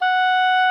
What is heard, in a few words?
OBOE F#5, REEDS; Greytsounds VOL-1